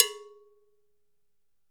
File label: LOW CUP GONG, African perc; AKAI S6000 CD-ROM - WorldSounds